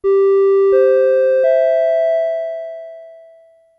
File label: Chime per message; Toni; Chimes and Announcements; Bosch